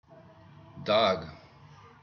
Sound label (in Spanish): perro